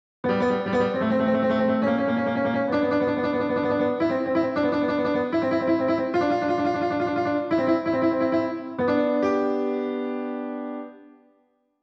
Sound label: Intro Keyboard Test KORG Triton; Standard MIDI Cable MOTU 828mk3 Hybrid VSTi Staff